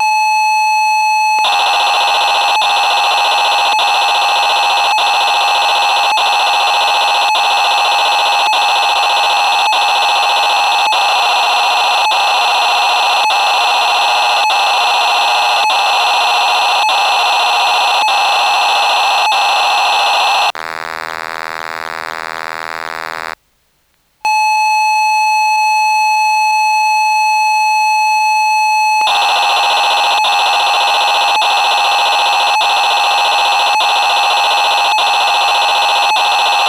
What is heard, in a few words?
Roland JX3P factory presets, Cassette Interface; Synthesizer Factory Patches